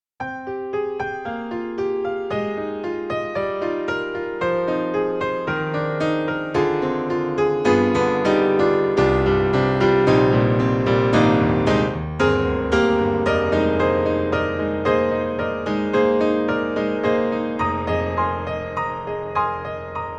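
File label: Model D Nicely EQed, piano; Steinberg The Grand 3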